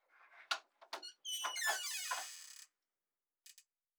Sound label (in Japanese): ドアを開ける